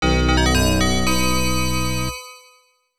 Item Fanfare; Arcus Odyssey; Genesis

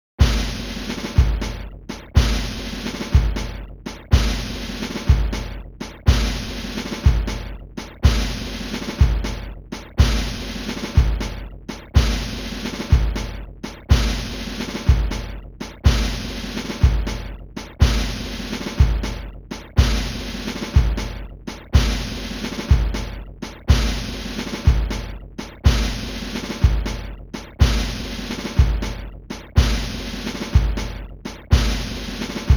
Cutscene Drum Roll; junk yard williams pinball

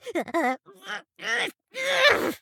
stagger

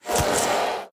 Ghost Attack; The Town

